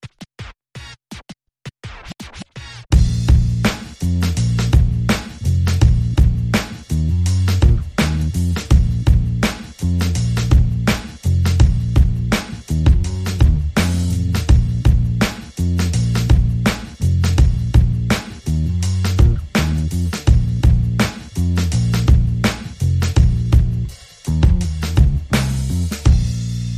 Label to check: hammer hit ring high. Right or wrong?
Wrong